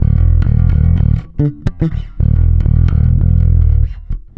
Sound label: NASTY E, bass; Spectrasonics; Bass Legends